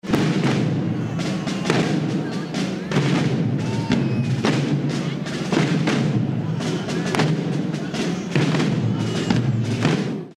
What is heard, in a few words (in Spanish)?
toque tambor; san juan